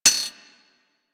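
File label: machine gun mech; Weapons; Archwing Mach Gun B; Warframe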